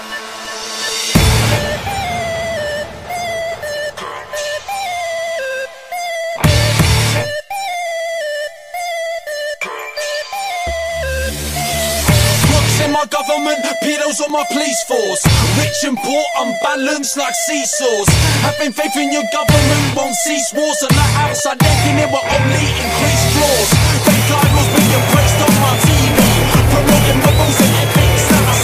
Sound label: HARD ROCK